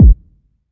long room reverb bass drum sample g key, kick drum one shot